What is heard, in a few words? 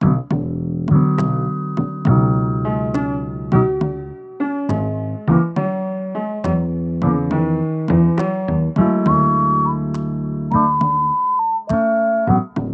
Kicks; 8PM Session 2019